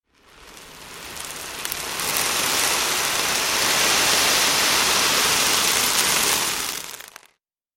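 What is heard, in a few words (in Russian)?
звуки кофейных зерен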